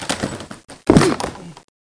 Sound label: TACKLE; LEGEND98